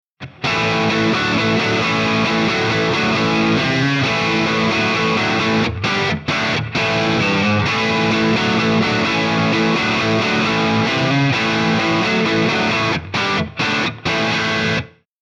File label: V30 Crunch